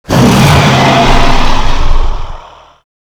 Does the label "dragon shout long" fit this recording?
Yes